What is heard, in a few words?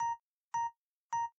time out effect